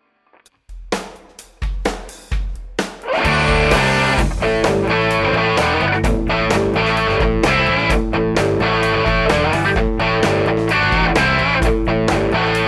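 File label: classic highgain bridge, humbucker; schwarzbach; HB59-Classic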